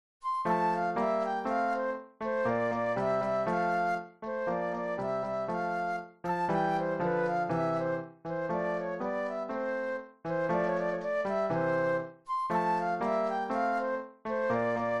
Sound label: Allegro Flute Solo; classical; Mozart; K. 3